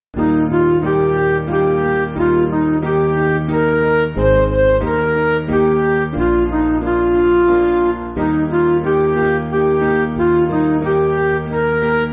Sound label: Midi